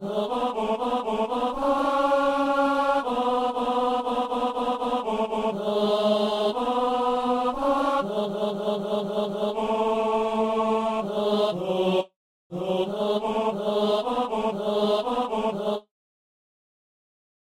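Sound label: tenor; comment ne pas te louer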